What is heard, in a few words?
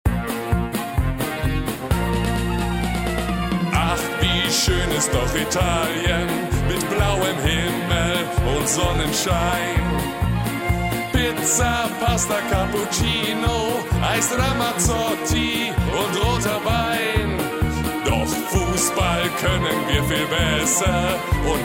song; deu ita; wm2006